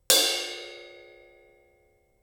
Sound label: Crash 22, drums singles; The Roots Drumloops